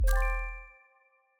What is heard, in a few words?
You Win